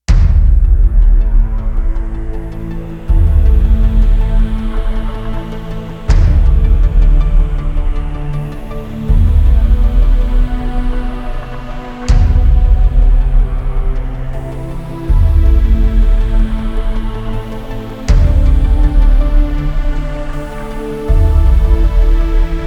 COMBAT TENSION Adjusted Ambient

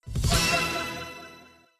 Achievement

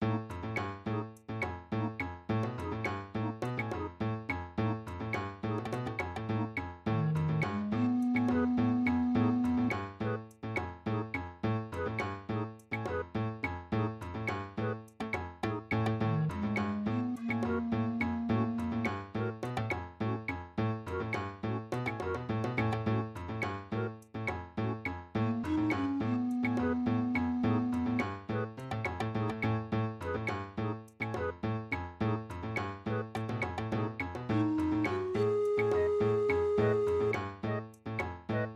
MIDI BGM

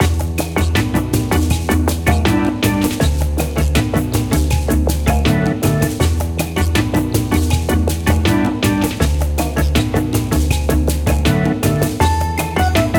Movie Rating Screen Music; A Hat in Time

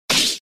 Barbarian Attack Hit; gamerip; mighty knight 2

